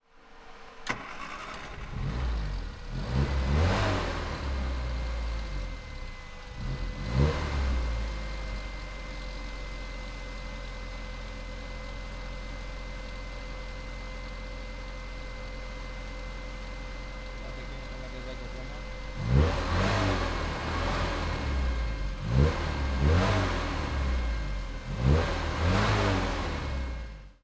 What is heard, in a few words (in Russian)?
dvigatel starinnogo mini cooper 1976 goda vipuska v rezhime ozhidaniya a zatem nazhatie na; gaz